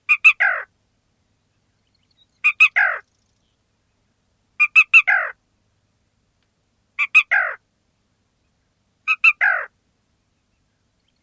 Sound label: ki ki kerrr